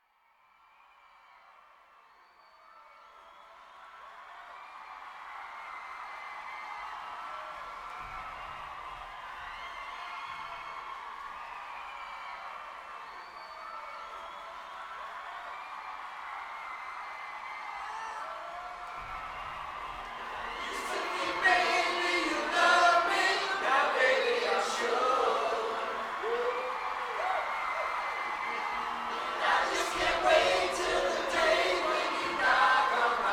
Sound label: crowd